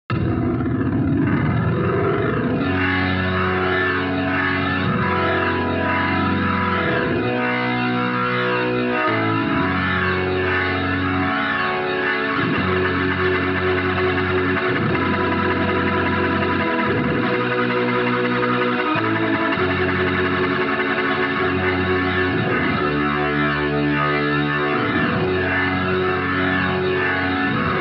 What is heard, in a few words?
Hammond Burn